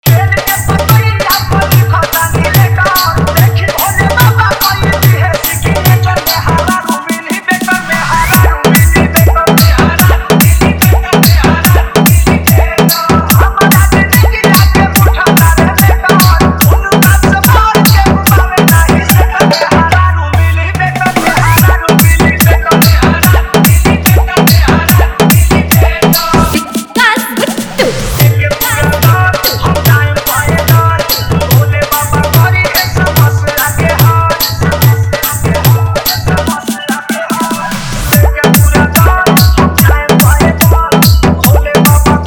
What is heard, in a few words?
Bhojpuri Song